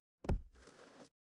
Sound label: ui drag